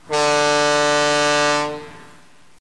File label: 3d blast air horn